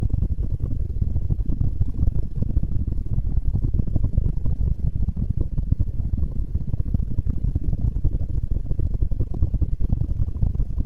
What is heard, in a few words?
Horses Running